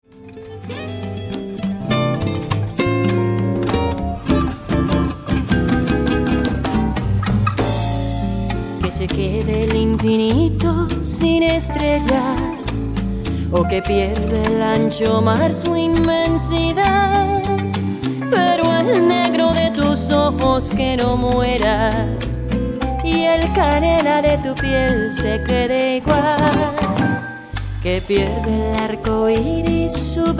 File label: latin fire